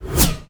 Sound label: spindash end